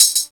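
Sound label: DOUBLE HAT; East West - Phat & Phunky CD2; 90's Sample CDs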